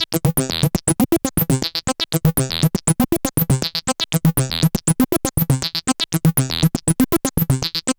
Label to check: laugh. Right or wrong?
Wrong